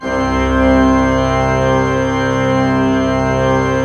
C2, Cathedral Organ

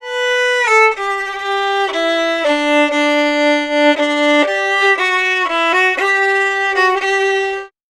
Fiddle, Appalachian

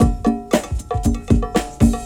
BREAK; Zero G; Man Machine

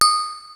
DRUMS; Rubber Chicken Software Sample Bank

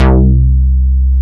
BAS, Eurotech; Sounds Good

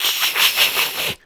Mouth Fx, Vocal

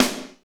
snare R B, Roland snares; Northstar - Drumscapes Roland

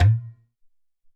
Tabl

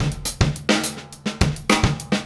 Straight Kit, World Pop Beats; Sonic Foundry (Sony Creative Software)

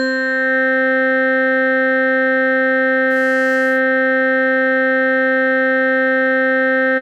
DIGIBELL C3, SYNTH, Trance; AMG; Global Trance Mission VOL-2

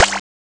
croni water; ST-XX Amiga Soundtracker Sample Packs

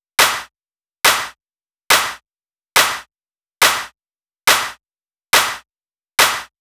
drum Loop 140BPM Clap

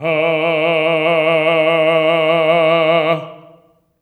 TENOR F E3; Spectrasonics; Symphony of Voices

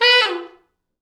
T Sax falls, Horns Sax

